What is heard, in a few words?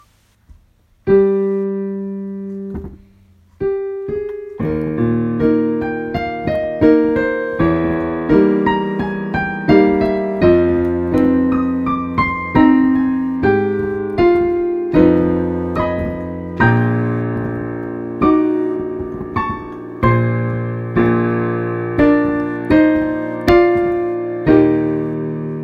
Duet Accompaniment